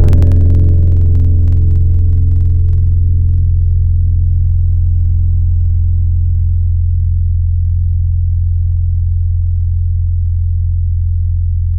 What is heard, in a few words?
Hit, Tropical Mallets; Synthetic's Serum Collection; SparkPackers